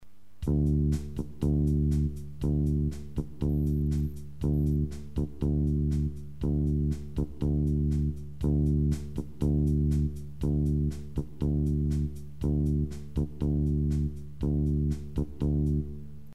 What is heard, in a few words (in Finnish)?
beat basso